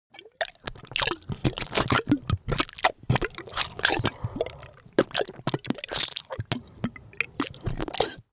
monster flesh eating; koerpergeraeusche; comedy-cartoon; soundpool

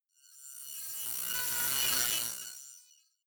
industrial riser short

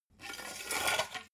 metal scaffold pole extend; industrie-wirtschaft

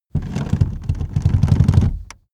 car seat slide backward slow rattle

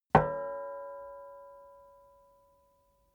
plate hit ring slightly damped; hits impacts

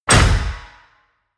slam, tueren

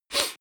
nose sniff male short; koerpergeraeusche; menschen; soundpool

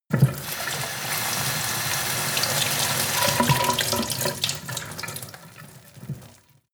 sink water pot medium

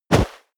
step walk snow; schritte

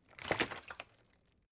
bottle water sparse shake single soft; berufe

